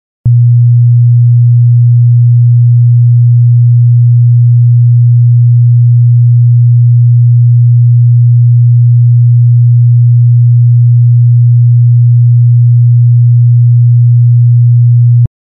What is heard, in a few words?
Bass 120Hz